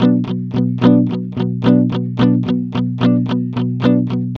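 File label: ROCK B flat minor 110, Vintage Blues Guitar; USB Soundscan vol.22; AKAI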